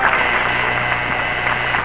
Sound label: buzzer